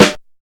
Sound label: studio acoustic snare D# key; snare drum samples